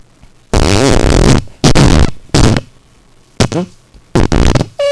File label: fart